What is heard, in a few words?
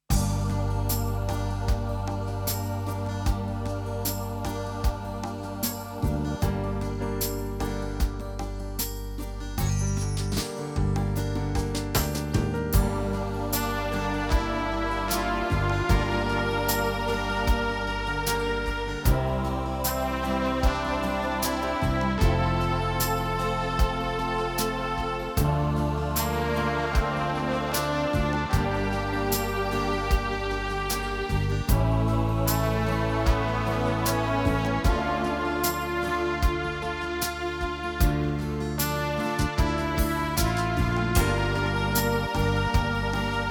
Korg Pa4x Unplugged Ballad; Unplugged Ballad2